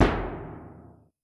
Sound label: Kick, Yamaha RY20